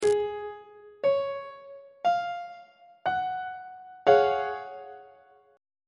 g flat major 7th suspended 2nd 1st inversion on treble clef